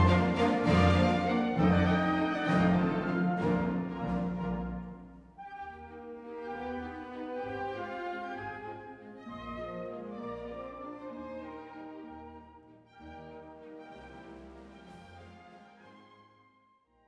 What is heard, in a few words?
original 24bit recording plus 54 dB; 24-96 Dither Shootout